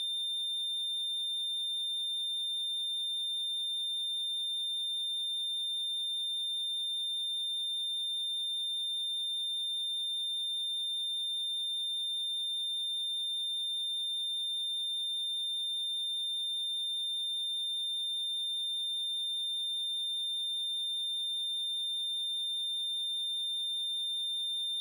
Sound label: Heart Monitor Flat; Medical; Blastwave